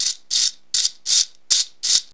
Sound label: SMALL SHAKER, PERCUSSION; AMG - Vince Clarke Lucky Bastard